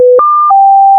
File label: clean audio test tone